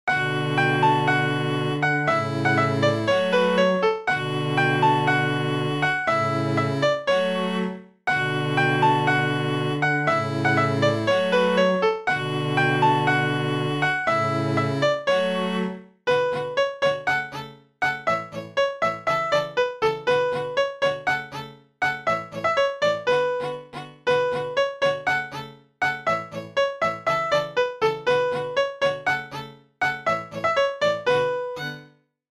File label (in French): piano cordes; langonnet